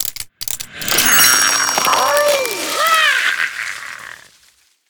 Windup Sapling Treant Play

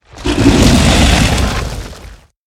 Living Monument Attack

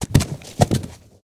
four hoof small attack underlay

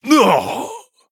Male Human Death

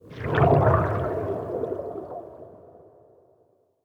Underwater Attack Underlay

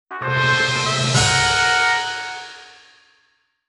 Play Stinger; Chameleos

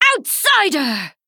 Female Human Attack

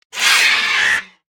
Enter Play

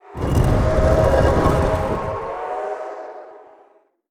Meeting Stone

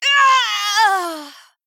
Female Blood Elf Death

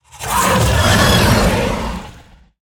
Marsh Hydra

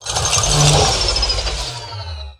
Tentacle Death; Octo Bot

